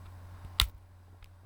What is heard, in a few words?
machine; 携帯開く